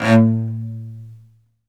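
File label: solo cello, strings; ILIO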